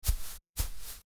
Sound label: footsteps on grass